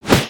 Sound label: Massive Spear Swing